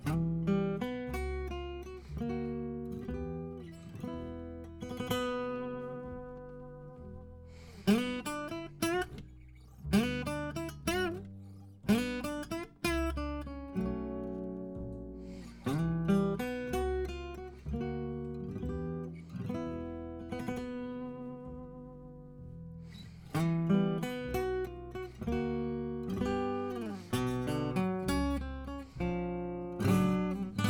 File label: Acoustic Guitar